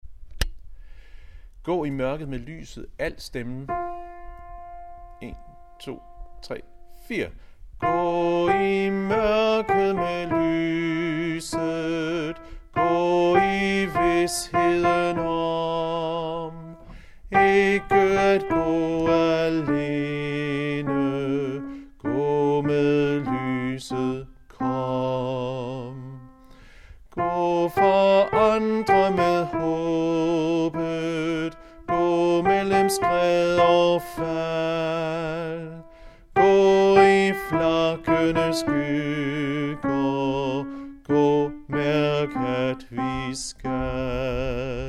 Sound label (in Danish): alt, harlev koret